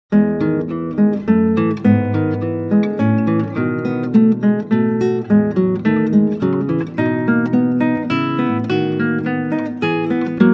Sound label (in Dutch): Klassieke Gitaar; Bach 50 Solos